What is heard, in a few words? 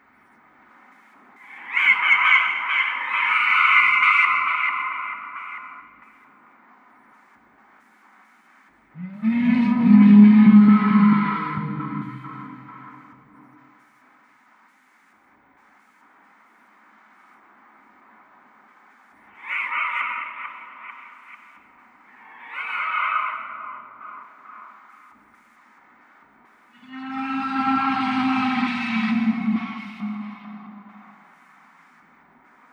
Amb Ice Monsters